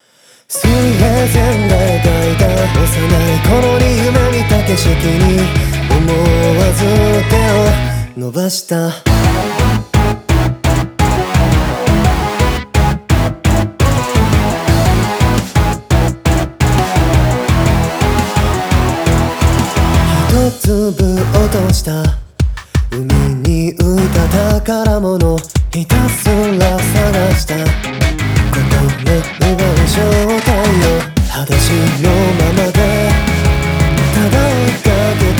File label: vocal